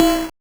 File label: Noise, 8-bit, Bitty Noises